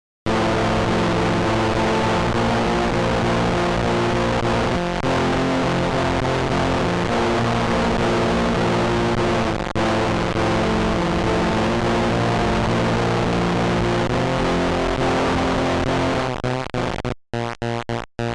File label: distorted